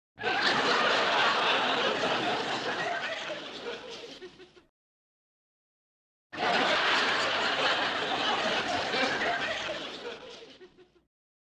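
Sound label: Crowd 40 Medium Large Burst; small int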